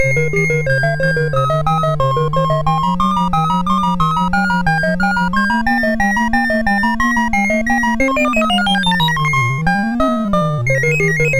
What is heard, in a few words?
sid MOS6581R4, GAMES; Accolades Comics